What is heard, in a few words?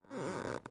Wood Creak